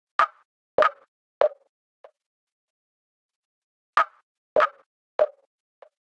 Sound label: house techno perc delayed and reverbed g sharp m 127; loops